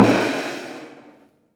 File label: Prepared Drums; Sonic Foundry (Sony Creative Software); The Electronic Music Manuscript Collection; Battery 3 Sample Kits